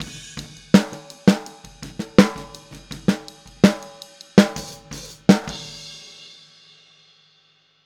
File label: Boogaloo - Outro - Snare, 82 BPM; Sonic Foundry; Drums from the Big Room The Mixes